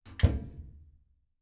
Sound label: Washing Machine Lid Closing, Household